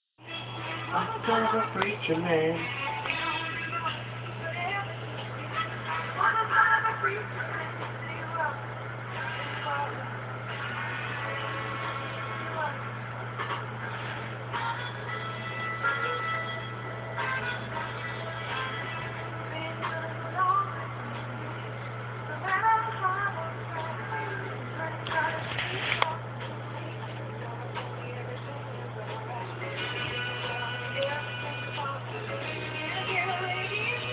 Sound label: singing, music; music playing, heater running